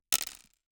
DICE on Hard Wood, Throw and Roll, Mini, 3 Three Dice; Samples; ArticulatedSounds; DICE Sfx Party (Extended)